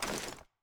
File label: ar ads up; weapons; gmod; viper; scharlie